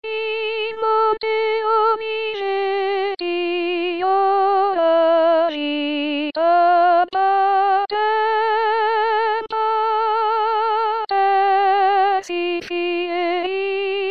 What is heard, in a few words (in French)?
in monte oliveti soprane